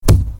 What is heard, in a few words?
Knocking Wall